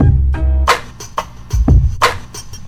Loop

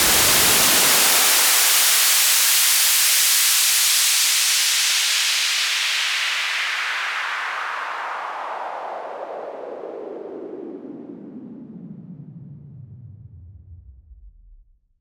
Fall Clean, Falls

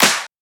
Shifted Clap; FLPRO

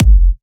Kick; FLPRO

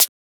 Closed Hat

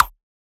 Perc, Percussion; FLPRO

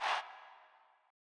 Chant

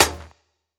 Hi Hat

